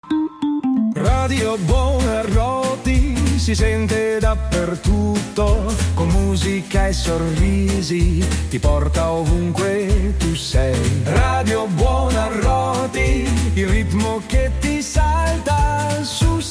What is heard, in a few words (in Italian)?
violoncello; radio buonarroti diamo voce all'istituto